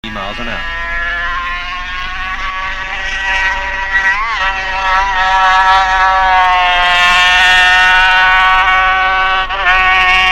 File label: Suzuki 125 2S, vintage bike; 1963